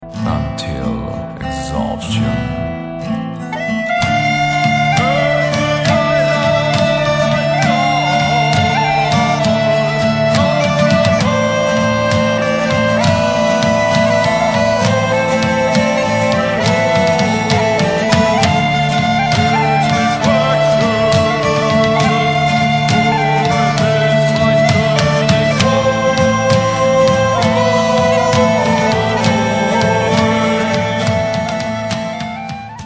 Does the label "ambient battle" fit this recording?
No